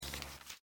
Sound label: paper turn quick